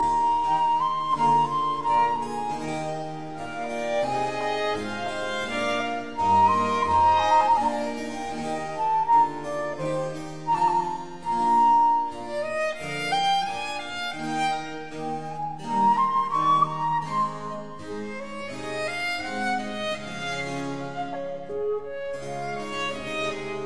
Quartet in A major Adagio; Quatrieme livre de 6 quatuors; Quartet No 3; TWV 43A4

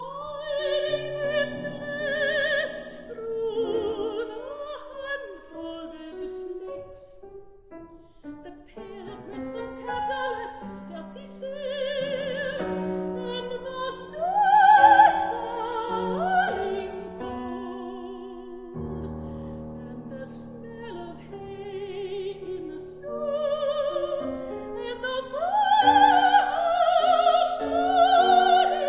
arr for soprano and piano; Wuorinen; A Winter's Tale; Phyllis Bryn-Julson

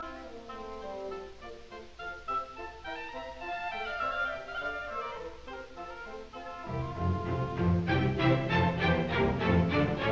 Suite in F major, Bourree; Handel; Water Music; arr L Stokowski; Leopold Stokowski

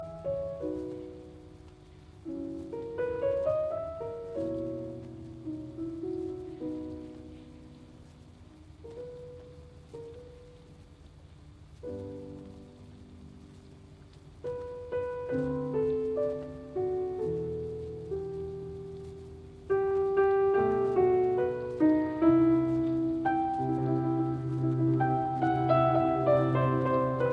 Piano Concerto in C minor Largo; Op 37

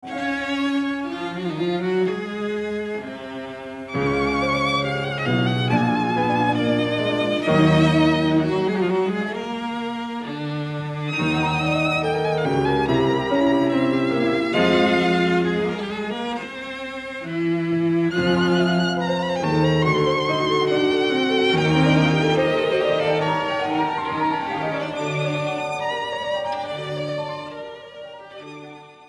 Piano Trio in e-flat Allegro; Mozart

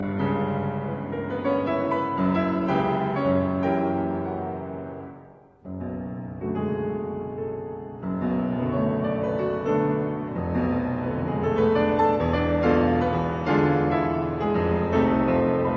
Piano Sonata in F-sharp minor, Drammatico; Op 23

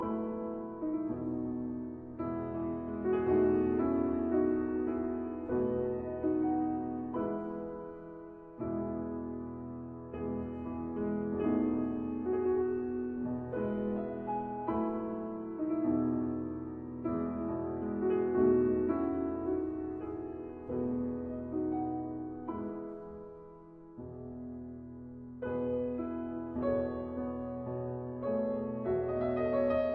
Musette en Rondeau in E major; Rameau

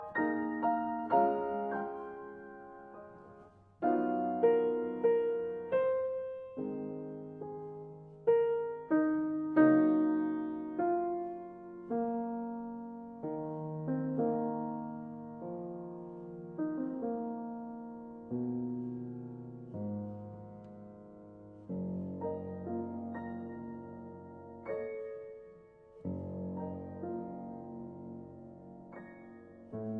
Piano Sonata

